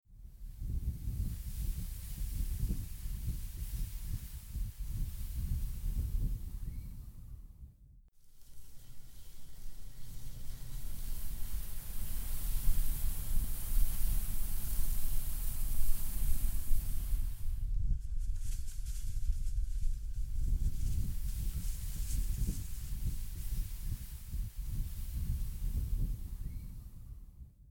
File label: wind rustling grass